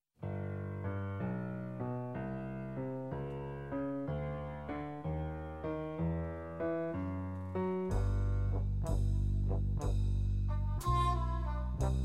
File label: Kicks and Sticks